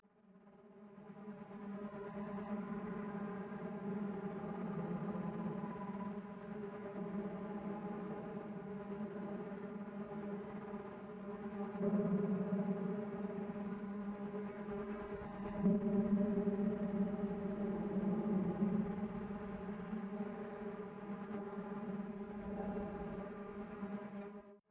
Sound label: Fly, Garden